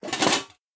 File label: armor hit